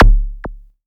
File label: kick, Korg Electribe ER-1; Kit 7